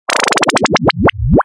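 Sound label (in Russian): игра окончена; Igra okonchena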